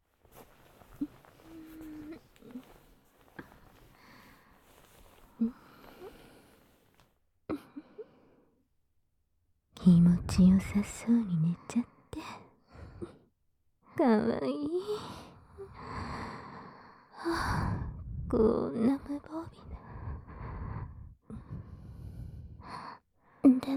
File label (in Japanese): 両耳舐め; 真夜中の侵入者の両耳舐め; ASMR